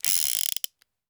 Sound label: item dueling pistols pickup